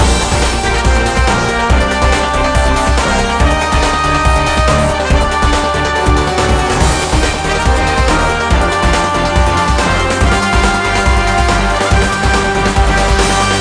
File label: Industrial; Emergency Heroes; wii